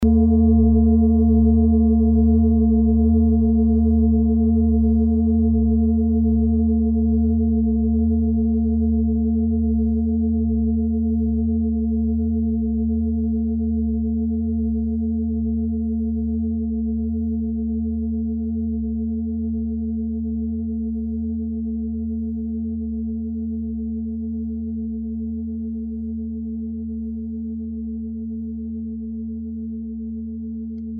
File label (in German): klangschale nepal, Klangschalen Nepal